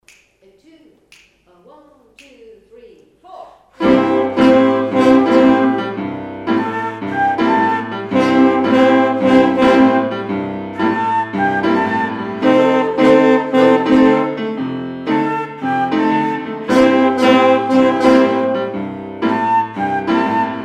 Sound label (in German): Saxophone und Querflöten; Klasse 6